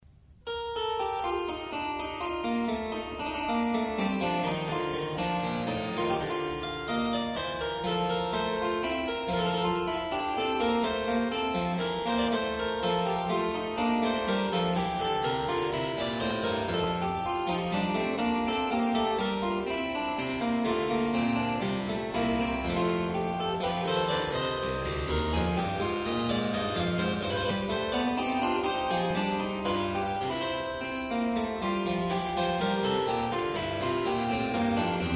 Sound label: kleine Praludien in C major; BWV943; Johann Sebastian Bach